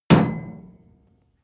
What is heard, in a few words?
metal impact hollow ringing